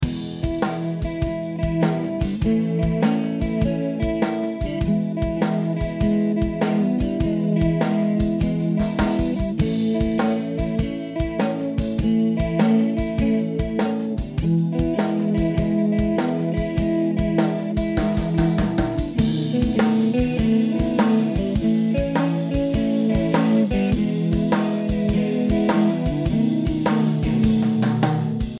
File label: 12 Bar Blues E